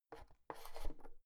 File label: oven knob press in soft